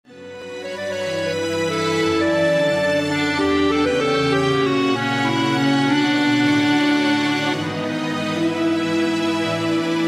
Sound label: No Voice